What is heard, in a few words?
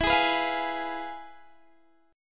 F Diminished E; strummed